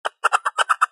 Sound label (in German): Eichhörnchen Sound